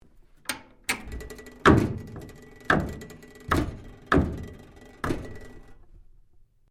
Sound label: DOOR WATERPROOF UNSEAL, Sounds onboard HMAS Castlemaine; Boat Sound Effects; Boats (Sound Effects:Vehicles)